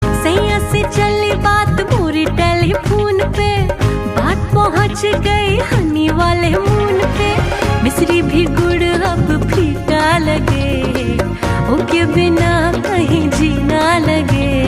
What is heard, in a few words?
Ringtone